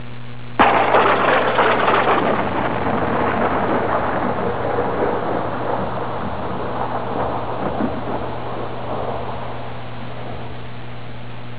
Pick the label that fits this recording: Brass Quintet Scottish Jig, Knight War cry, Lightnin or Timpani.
Lightnin